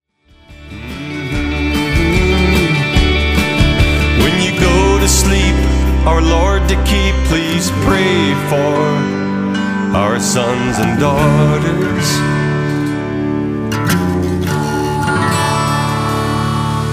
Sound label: peaceful someday